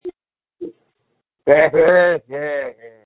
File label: Scream